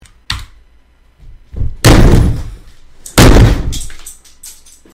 smashing table

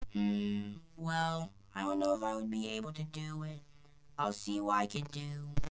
puff ball

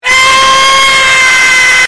scream

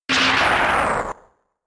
thunder; pokemon dpp

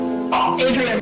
bang

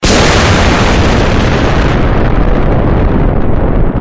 explosion; lopez

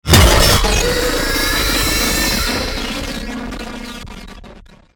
jumpscare; pig patch; hw2

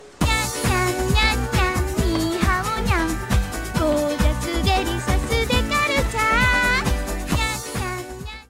nyan beat

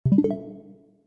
switch home

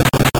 punch

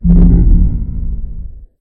windows 3 startup

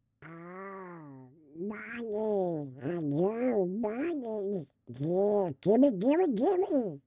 duck money

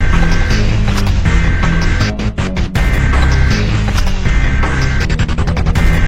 domino effect

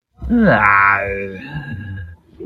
bassboost

